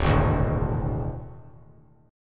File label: D Suspended 2nd Flat 5th D; strummed